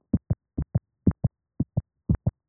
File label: Normal Small Dog Left apex; herzgeraeusche